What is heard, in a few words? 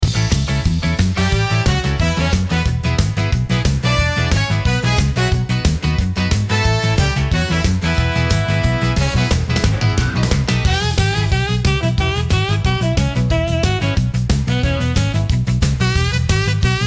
Wildfire Sax